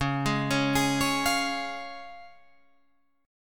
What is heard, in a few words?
Db Major, Guitar Drop D